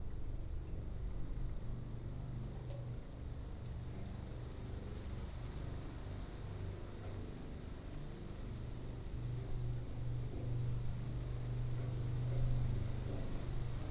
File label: HYDRAPHONE TUBE; TASCAM